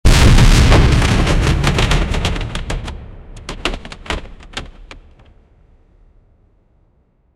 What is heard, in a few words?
battle hammer ground smas